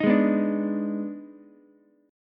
D sharp Major 7th Suspended 4th Sharp 5th D sharp Ukulele, chord; strummed; 8,8,7,5; JGuitar